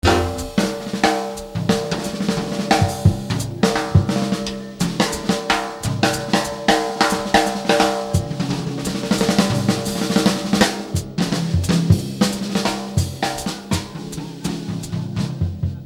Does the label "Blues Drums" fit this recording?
Yes